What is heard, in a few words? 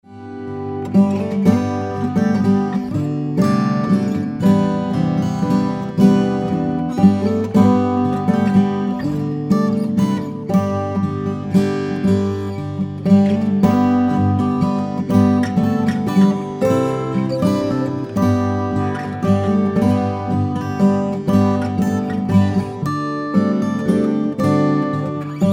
Waltz